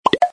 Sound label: click panel